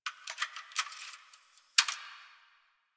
Computer Room Floppy Disc; Warframe; Ambience; Nineteen Ninety Nine

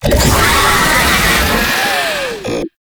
Pain, Vocals; Warframe; Proto Infested; Babau

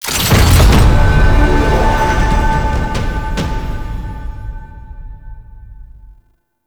Vendor Conquest Purchase, UI; Entrati

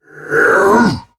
Attack Effort; Warframe; Dax Heavy; Battle Barks

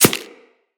Arrow Impact Flesh; Weapons; Bullet Impacts

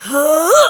Throw, Barks; Kuva Lich; Persona B; Ability

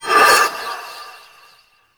Heavy Scythe Blade Accent; Warframe; Duviri Heavy Scythe

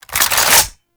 AK47 Reload Mag In; Warframe; Tenno

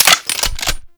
Double Barrel Shotgun Fire Mech; Warframe

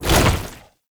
Tank Infested Arm Move; Warframe; Lasrian; LasrianTank; Movement

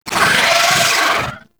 Vocal Death; Warframe; Moa Satyr

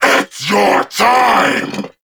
Vocal Player Spotted; Warframe; Duviri; Dax

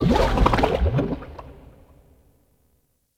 Coolant Gurgle; Ambience; Props; Warframe; Venus Open World; Venus Landscape

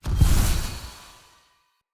Smoke Burst; Verminia

